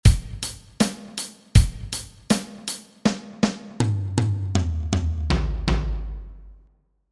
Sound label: fill